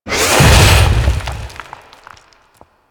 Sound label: Death Vocal; Ambience; Golden Maw; Warframe; The War Within